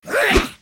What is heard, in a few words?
attack; Monster; toran